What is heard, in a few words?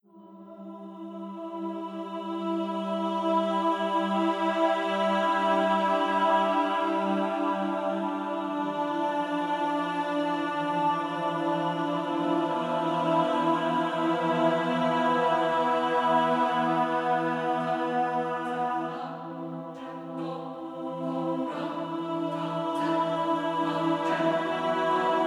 Epic Choir